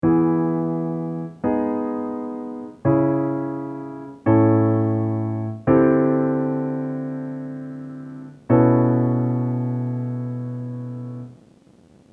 Chord Progression E

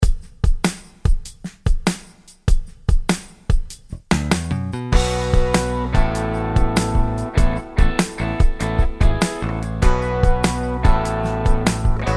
Major Minor Pentatonic Backing Track; a guitarists guide to major and minor pentatonic scales

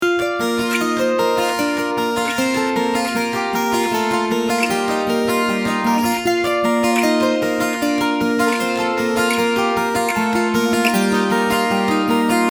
Both Parts, guitar; alternate guitar tunings

